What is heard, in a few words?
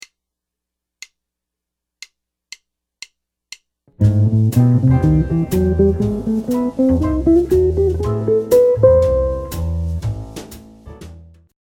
arpeggio approach notes, jazz guitar